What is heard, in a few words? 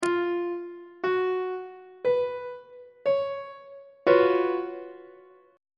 f sharp major 7th suspended 4th 3rd inversion on treble clef